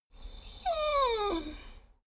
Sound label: dogo argentino whine squeak; natur-tiere; hunde